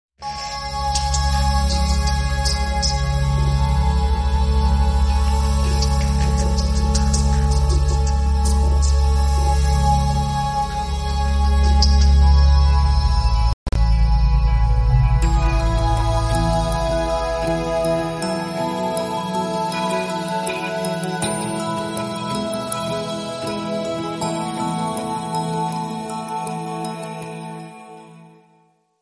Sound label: Synths Only